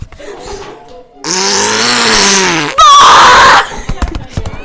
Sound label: boy sharts while screaming in pain; sharting